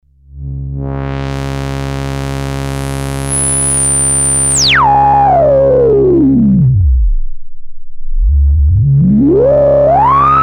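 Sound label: lowpass, Compact analog monophonic synthesizer; MFB nanozwerg; test: lowpass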